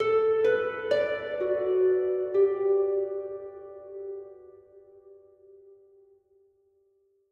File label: Harp High; gamerip; that dragon cancer